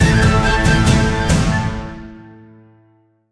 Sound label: bigwin outro; adelia The Fortune Wielder; Foxium